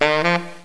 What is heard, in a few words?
SAX